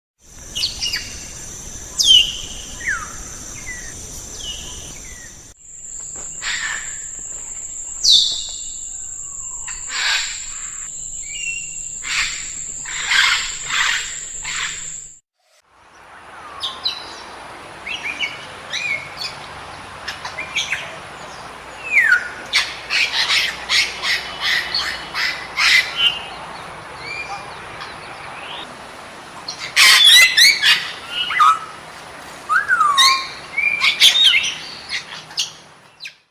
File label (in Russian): zhako v djung